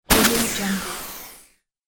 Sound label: industrial glitch damage; futuristic sounds; آسیب به سفینه فضایی